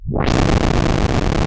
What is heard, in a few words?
SH101 Blast; transition